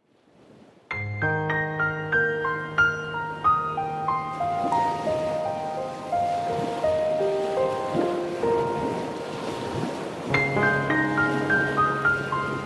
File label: piano over waves